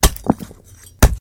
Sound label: bone break stab